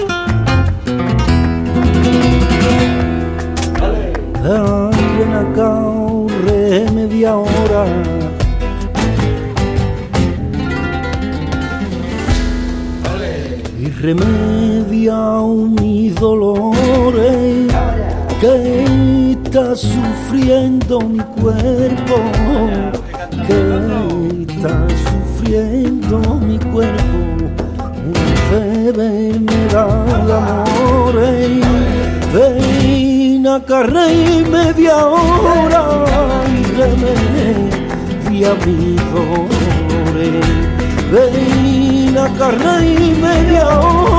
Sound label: letra petenera solea x buleria, flamenco